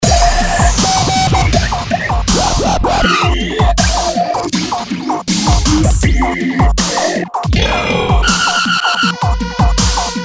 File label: Dubstep Drop short Energetic